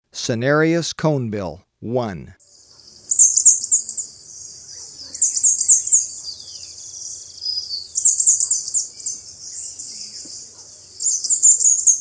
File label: Cinereous Conebill; S. America